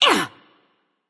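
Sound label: grunt attack, female; smite; voicepack; hera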